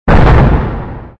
Explode Small; planearcade

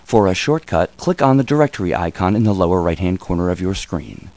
om; Odyssey Incentive Vacation Travel Planner

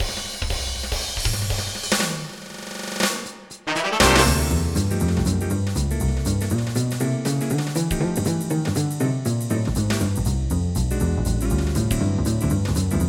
jazz funk; gmplay; midi-files

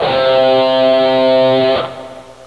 guitar low, string instruments; Sound Effects for Multimedia - Volume 1

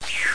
ROLL OVER

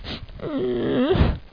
whimper

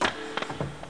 weird sound

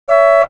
Porsche Horn Blast; KABOOM! - Wild and Wacky Sound Software; City Life; Autos

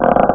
CREAK; Shoot 'Em-Up Construction Kit; Palace

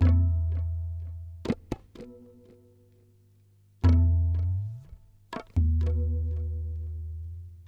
PERCLOOP ETHNO 125; eJay

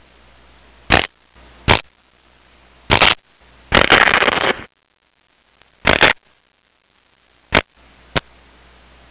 Various Bone Cracks; Human

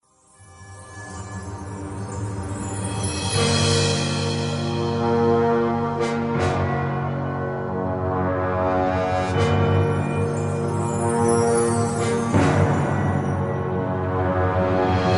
game music; planearcade

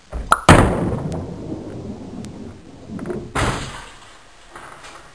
Bowling Ball Hitting; Soundsation

